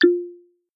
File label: button; actmod